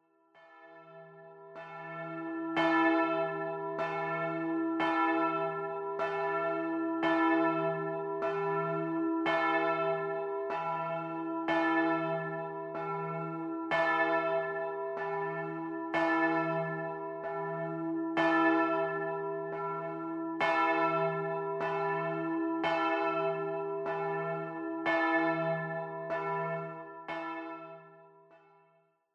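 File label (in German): pastorenglocke, geläut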